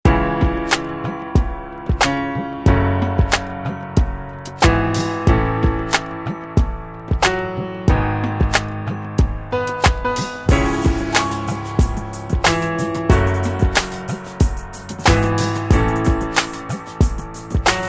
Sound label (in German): Fluegel Beat